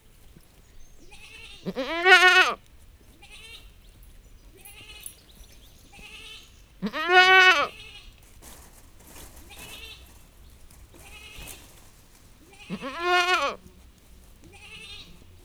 kecske parbeszed az olbol; parasztudvar; hazikecske

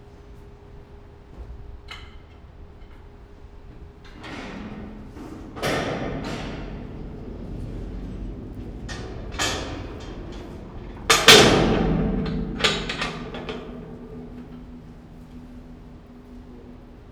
rácsozatok kinyitása két trombitálás, ázsiai elefánt; nyiregyhazi zoo 2010

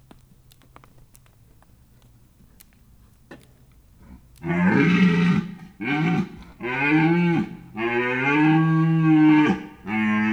gimszarvas jobbrol makk potyogas, allathangok, zoo; budakeszi zoo 2010

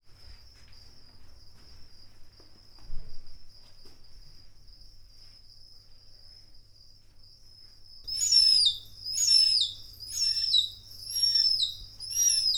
hét hónapos fióka tücsök, barna rétihéja; miskolci zoo 2009

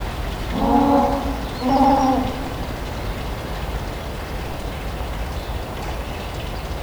szellozohangja, papaszemespingvin; debreceni zoo 2012; marantz puska

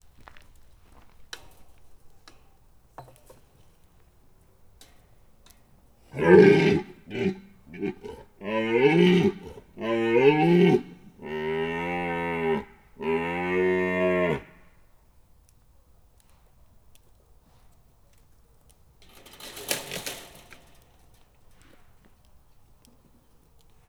gimszarvas kerítésnél bőg; budakeszi zoo 2010

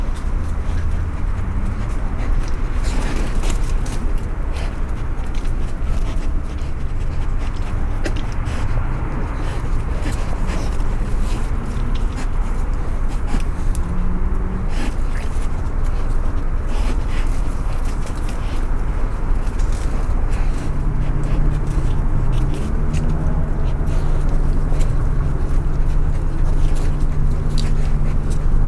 erosen szimatolnak, foltoshiena

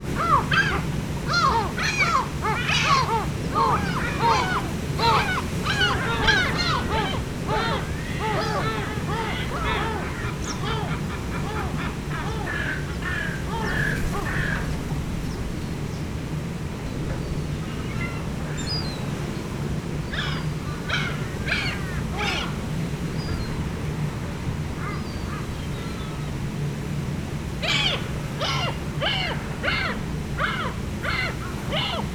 sárgalábú sirály, halastavak; hortobagyi nemzeti park 2013

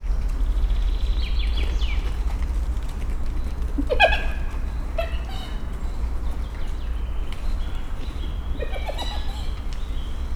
hiena kacaj

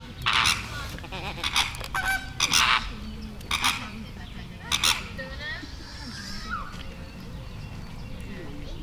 neszezés, rózsás flamingó, chilei flamingó; veszpremi zoo 2013; fr2 leonagy

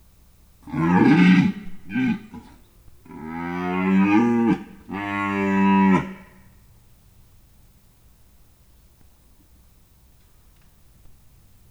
gímszarvas; budakeszi zoo 2010

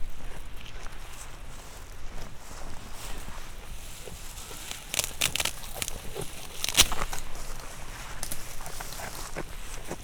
kecske fűvettep; parasztudvar; hazikecske

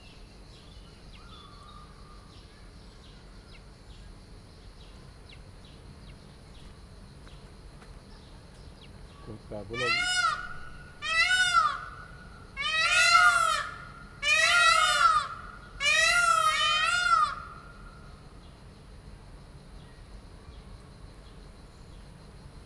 ha csendben maradtam volna, páva; makryammos beach; thassos

